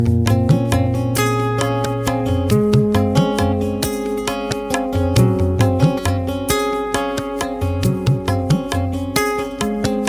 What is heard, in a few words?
violin ringtone